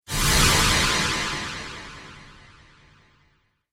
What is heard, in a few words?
Modulation Whoosh; Whoosh Sound Effects